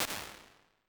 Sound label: Hybrid Halls Slap Hall, Impulse Responses; Ableton Live 12 Lite